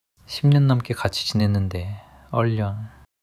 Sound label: crying